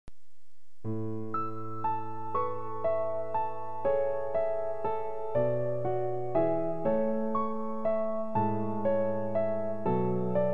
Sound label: divertimento pastorale